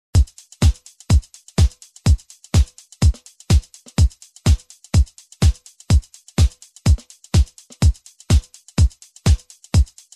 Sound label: Jazz